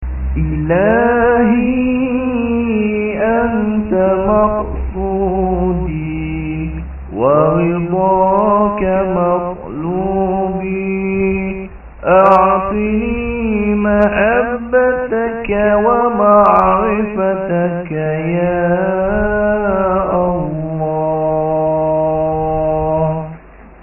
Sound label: Ilahi echo